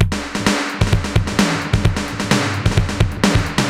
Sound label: Space Echo HR-16B 130BPM